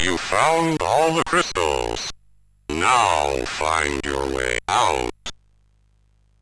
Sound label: sid MOS6581R2; Cave of the Word Wizard